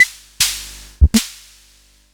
Bontempi HF2220

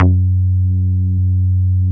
CRACK F#2, BASSES

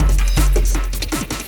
LOOP, Drum & Bass, 160 BPM; Ueberschall; Drum & Bass Resonance

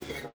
GUITAR FX; Xsample VOL-2

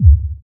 DR. LOOP, DRUMS; X-Static Goldmine 3

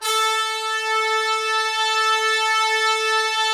Trumpets; Roland - LA Composer vol. 4

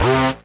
RAVE, RAVESYN

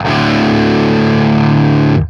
5TH D, Guitar; East West; Steve Stevens Guitar Samples Collection

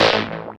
DR. LOOP, BUZZ; X-Static Goldmine 3

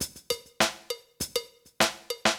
drloop, drumloops, Vinyl Attack; Masterbits

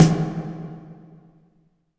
IMP SNR DRUM, Drum, Cinematic Impact

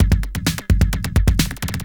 drt 130 electro, DRUMLOOPS, LOOP ELECTRO

